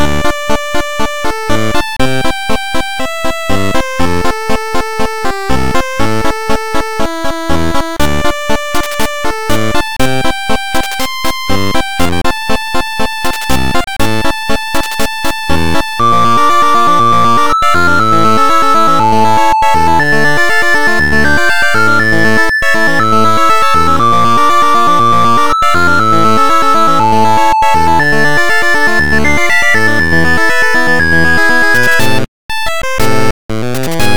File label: 8bit harukanaru daichi Loop